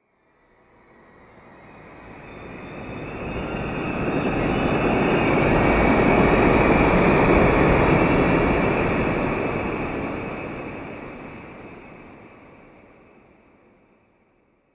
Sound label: whoosh dark ominous swells and receeds with rapid dischordant notes